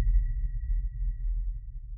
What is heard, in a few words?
sonar Tail Suit Far Beacon; Barotrauma; Real Sonar